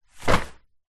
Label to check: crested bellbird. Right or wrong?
Wrong